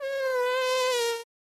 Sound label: Mosquito flies by into ear